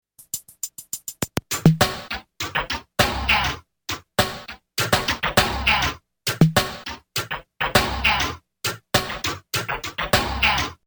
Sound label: Loop